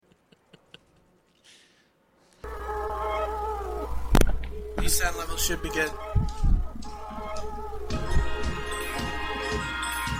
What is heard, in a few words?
karaoke